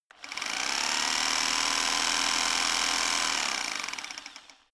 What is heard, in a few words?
rock drill chisel fast; maschinen; schrauben drehen hydraulik